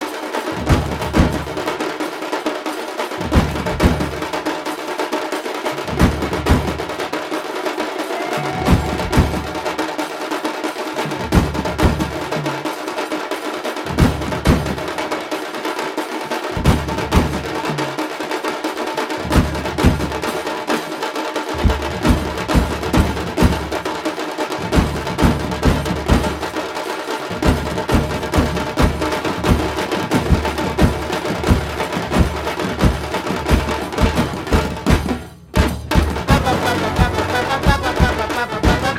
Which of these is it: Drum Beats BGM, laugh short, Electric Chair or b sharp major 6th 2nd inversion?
Drum Beats BGM